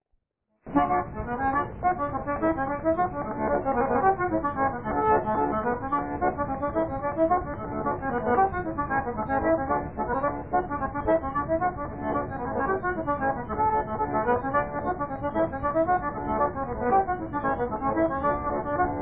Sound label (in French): accordeon